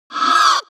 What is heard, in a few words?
creature wraith hit